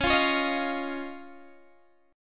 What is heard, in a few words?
C sharp Major Add 9th, Guitar, Open G